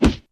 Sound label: block fist; Mount&Blade Warband